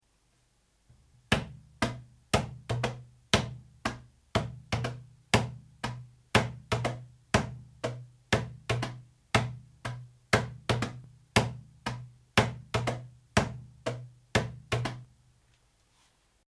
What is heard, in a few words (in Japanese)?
第一パターン（太鼓合奏曲ングワイ）; africa